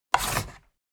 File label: Checkers game sliding piece; leisure